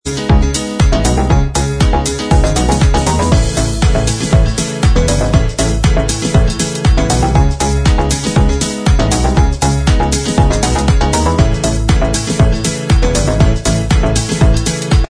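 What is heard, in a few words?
Instrumental Track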